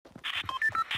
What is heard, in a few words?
Chirp; Fortnite